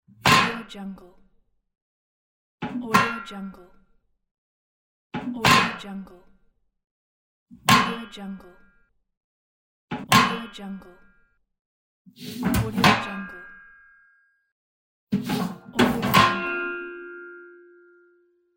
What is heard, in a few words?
metal trash can close